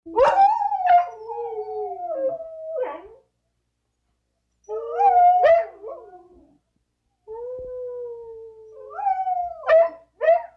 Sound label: 2 Dogs Howling Indoor